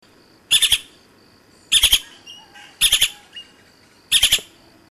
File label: golden cheeked woodpecker